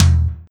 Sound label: tom; virtual drum machine